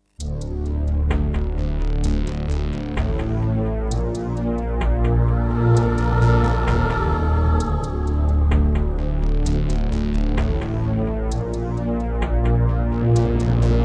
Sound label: Aztech CS9233 DB; Descent II